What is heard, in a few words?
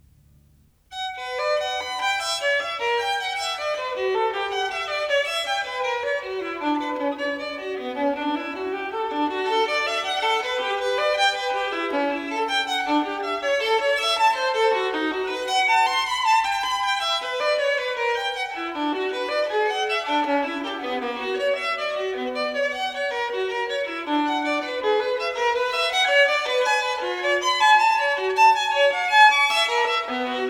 Partita in B minor - 2. Double, Solo Violin; Partita no.1; BWV 1002